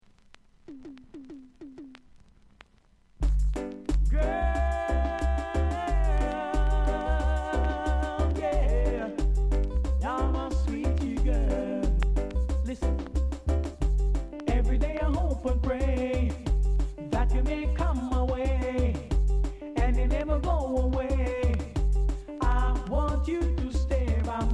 SINGING

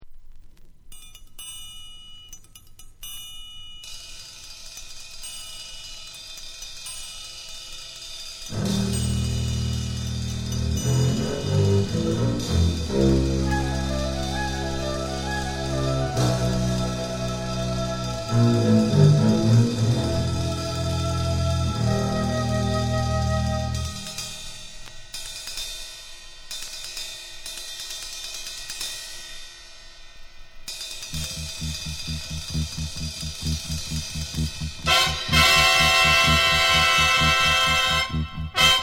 JAZZ

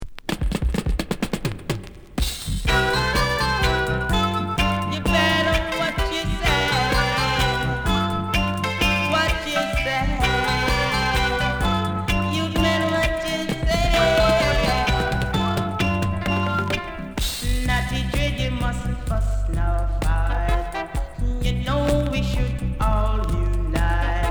reggae music

